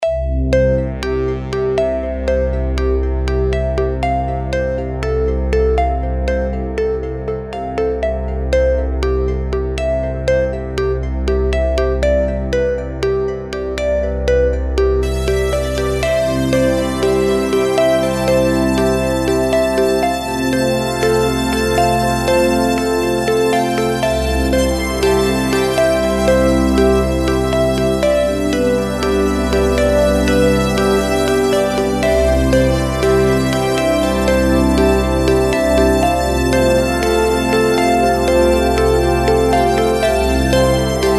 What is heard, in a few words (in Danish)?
drama musik; backstage dramastykket